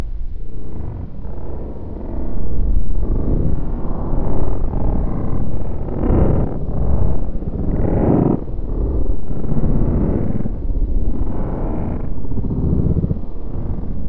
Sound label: Metal Structure Creaking